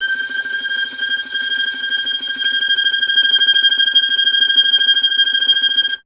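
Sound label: string violin tremolo; Miroslav Vitous; Solo Instruments VOL-2; Solo Inst. II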